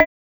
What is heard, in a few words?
Timer